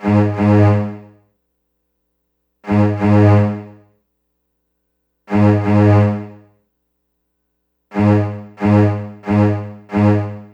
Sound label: 091 E-Coast HipHop String Bass Abmin; Strings; Pro Tools Essential Loops; Digidesign